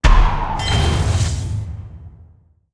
solo multiplier Start; netent

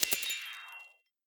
quickStop; netent; butterflystaxx

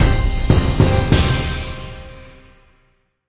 big Win Loop End; netent; bloodsuckers

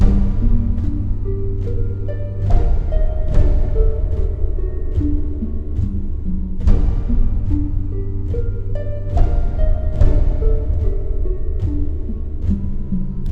ambience general; netent; kingof3kingdoms

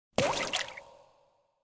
pick n Click droplet; netent

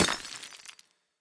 ice Break; netent; magicportals

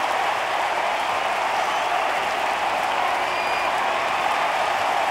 freespin Outro Idle Loop; netent

